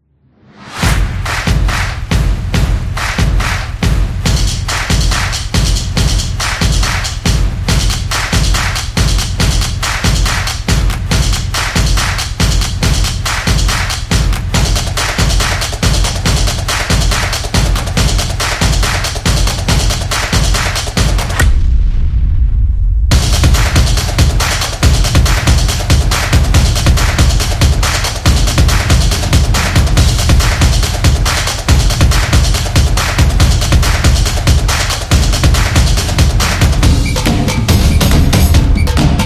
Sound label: Sports Dynamic Drums